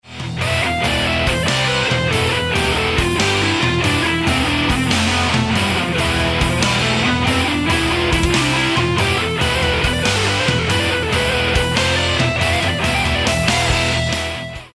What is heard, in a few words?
metal guitar scales phrygian